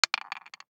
DICE on Wooden Tray, Throw and Roll, Standard, 1 One Die; Samples; ArticulatedSounds; DICE Sfx Party (Extended)